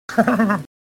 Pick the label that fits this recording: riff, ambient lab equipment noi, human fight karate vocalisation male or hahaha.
hahaha